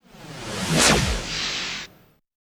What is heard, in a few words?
fx reverse; Ueberschall; Techno Trance Essentials